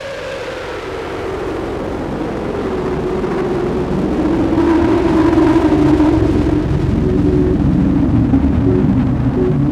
WIND; Best Service - XXL Production Effects; WIND+STRM

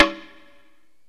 AFRICAN INST; OMI - Universe of Sounds

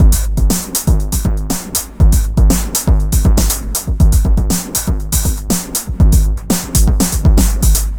scary electro 120bpm, Downbeat Dance Grooves

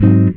GTR CHORD; Univers Sons; Jazzistic

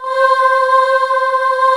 PAD CHOIR C5; USB Soundscan vol.28 - Choir Acoustic & Synth; AKAI

